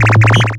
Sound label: SHORT, SHORTNOIS; USB Soundscan vol.14 - Special FX Twisted